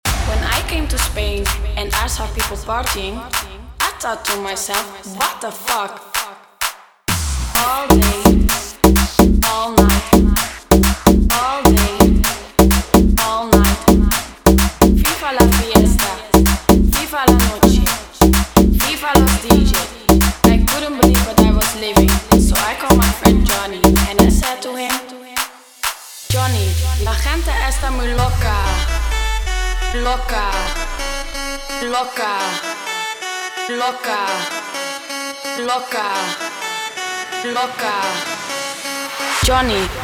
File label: aleteo 128bpm; Latin beats; Bootleg Mashup Remix; Peru